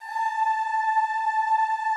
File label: A4 R, Classical Choir; Best Service; Extended Classical Choir